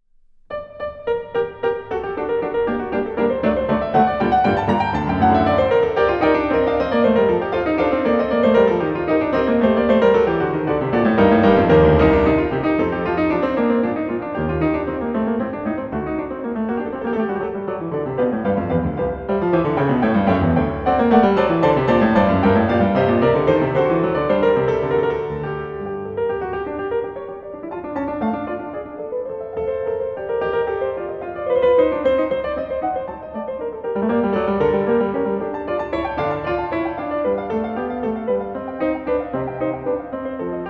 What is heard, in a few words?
G Minor, Prelude; BACH; Simone Dinnerstein; English Suite No. 3; BWV 808